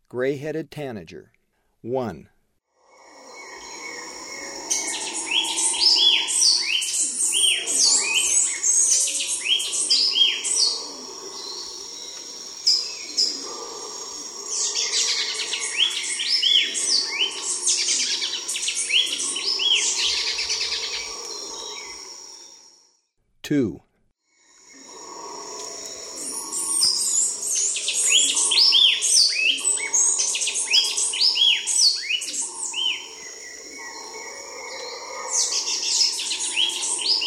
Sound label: Gray-headed Tanager; S. America; Eastern Lowlands